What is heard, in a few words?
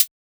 Hi-Hat; ANTENNAS